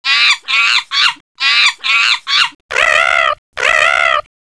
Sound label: Chimp Repeat